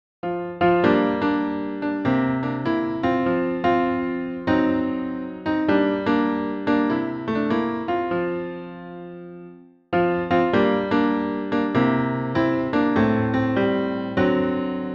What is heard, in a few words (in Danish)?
tenor